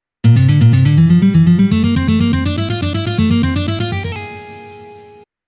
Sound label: solo